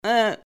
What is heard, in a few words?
noodle ehh